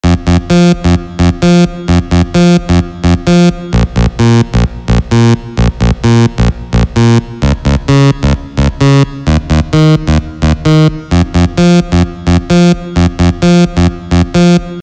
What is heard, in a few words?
illusion bass